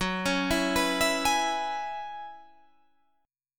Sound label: Gb 9th Suspended 4th Gb down Guitar Standard, chord; Gb9sus4; arpeggiated; JGuitar